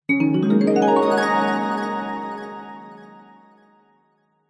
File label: fantasy magic glissando warm harp mallet bright ascending; zapsplat